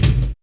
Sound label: RM MACHINE, HIP HOP; Pro-Rec - Synthsonic